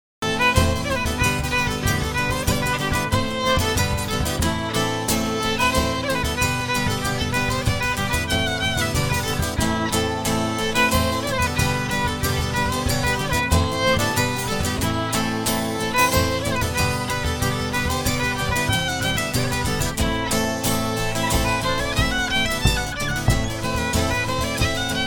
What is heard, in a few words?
hornpipes